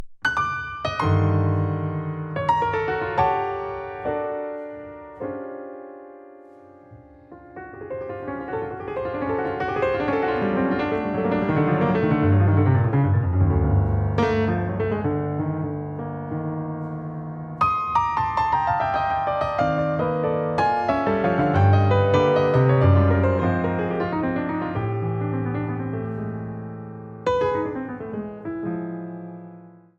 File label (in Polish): Tango Elektroniczna